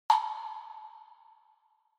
Woodblock Low

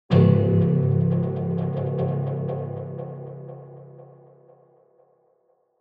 Onoma Negative Long; オノマトペ ネガティブ05-3(長); 効果音＞オノマトペ・ネガティブ

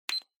Stopwatch Digital Start Stop

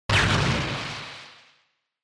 Explosion Short

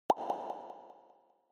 Onoma Pop Mid Wet

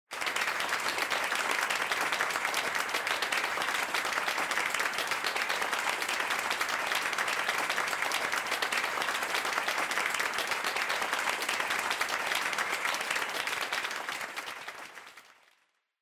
Applause Far Long

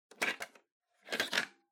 Portable Stereo Tape Open Eject

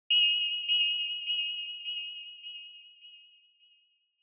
Onoma Flash Low Delay; オノマトペ 光る; 低 ディレイ

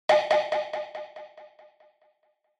Pop Low Delay; Onoma

